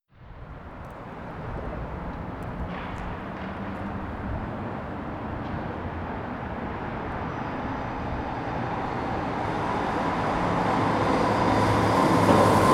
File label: Vancouver Noise stereo cardioid, traffic